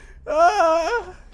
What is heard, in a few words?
orgasm